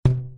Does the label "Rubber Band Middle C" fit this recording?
Yes